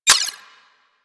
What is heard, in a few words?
Primary Mech, Weapons; Sapient